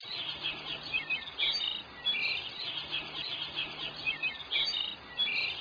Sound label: bird repeat, Ambient Intermittent; QuickTime for the Web